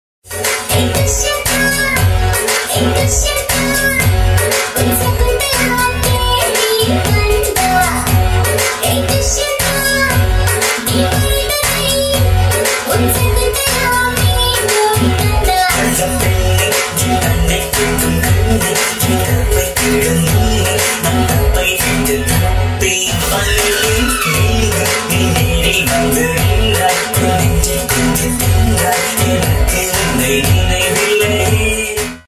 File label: south emotional ringtones, sad background music